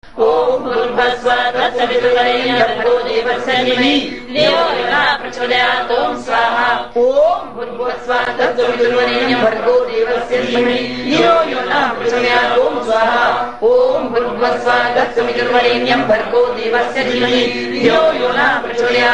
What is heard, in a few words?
gayatri